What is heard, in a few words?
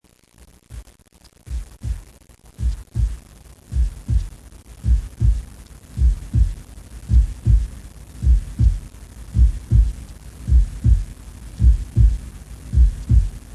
Heartbeat